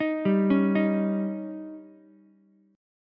Eb 7th, Ukulele; Eb7 arpeggiated; JGuitar

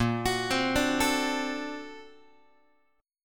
A sharp Augmented Major 9th Guitar; arpeggiated; JGuitar

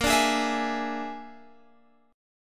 A sharp 7th Flat 5th A sharp, Guitar Open G; 20,19,18,21,21,x; down

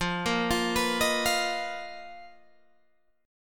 B Major 7th Flat 5th F, chord shape

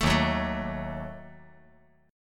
Eb Diminished; Ebdim strummed; JGuitar

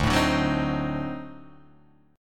D 7th Flat 9th D down Guitar Open D

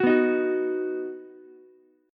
D sharp Minor Sharp 5th D sharp down Ukulele; strummed; JGuitar

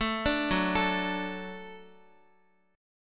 Gb Minor Sharp 5th Gb Guitar; arpeggiated; JGuitar

